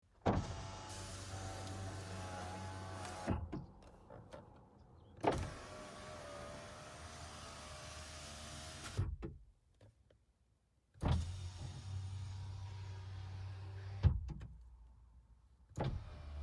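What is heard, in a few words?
truck power windows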